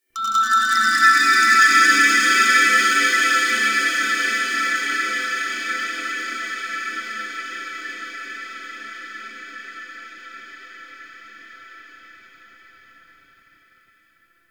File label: Kalimba C, Ambience; Warframe; Nokko Colony